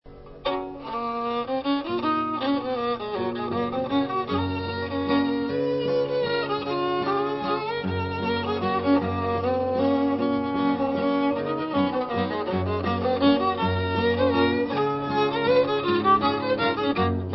waltz

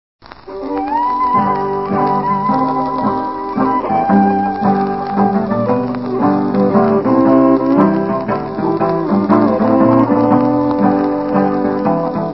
waltz g

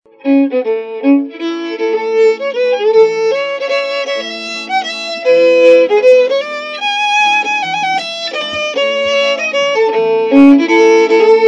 Waltz

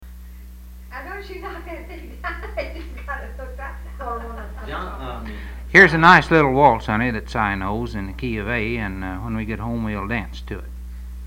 waltz in a

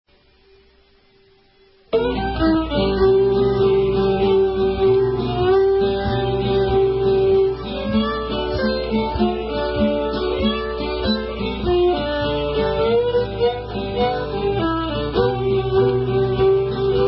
much lower blues

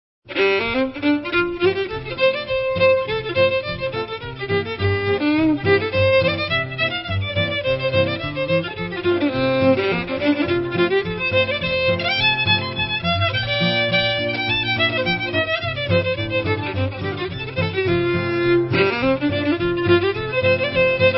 F Hornpipe

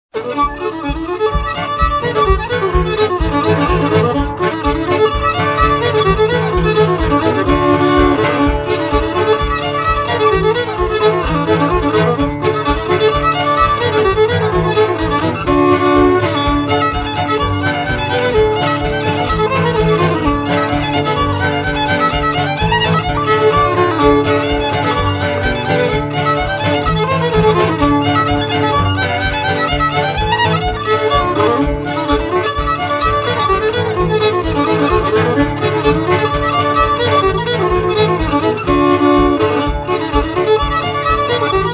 Hornpipe

